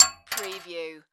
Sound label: Bullet Shell 9mm Drop; sound-effects-bible